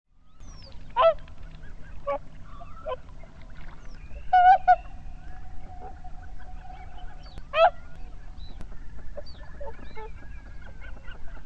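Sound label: whooper swan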